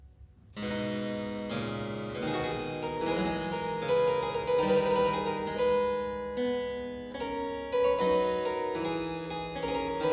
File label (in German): Choral- Wer nur den lieben Gott lässt walten; J.S. Bach; BWV 691; Notenbüchlein für Anna Magdalena Bach